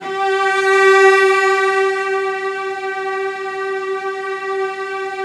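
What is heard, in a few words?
CELLOS A4, Strings; ILIO; Synclavier Strings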